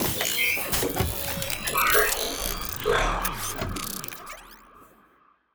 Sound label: scifi drone; Stellaris; astral planes